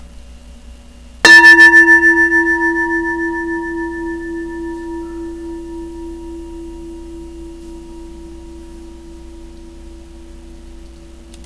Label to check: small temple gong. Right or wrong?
Right